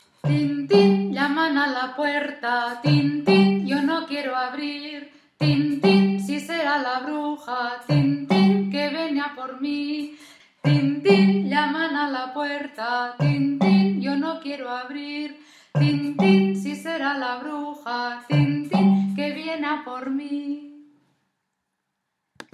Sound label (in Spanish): tin tin